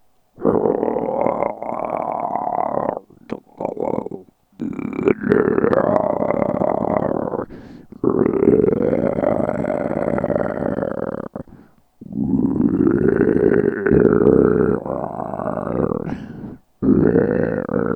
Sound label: monster growls